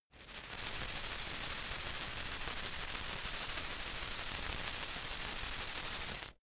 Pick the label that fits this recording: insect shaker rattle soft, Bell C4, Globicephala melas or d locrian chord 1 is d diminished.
insect shaker rattle soft